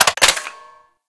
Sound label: Hand Shotgun Mech; Grineer